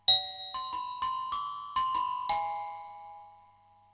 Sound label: Glockenspiel; speed 0.83; tsmtoolbox